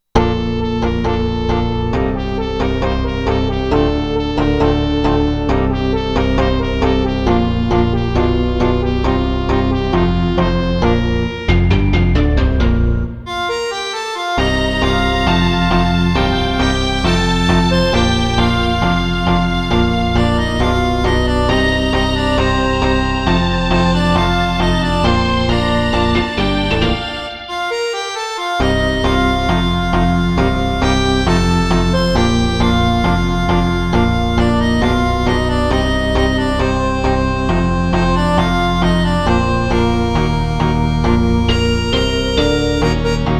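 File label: italian tango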